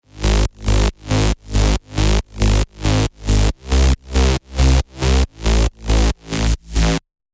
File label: Reversed, techno bass; Spire; revealed spire techno bass vol 1